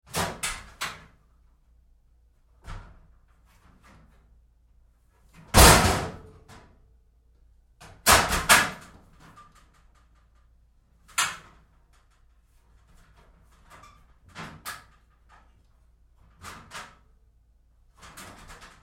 Door, Locker, Handling, Moves, Roomy, Lite, Metal Door; Metal Doors; BigRoomSound